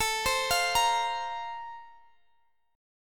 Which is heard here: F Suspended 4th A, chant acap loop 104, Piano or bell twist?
F Suspended 4th A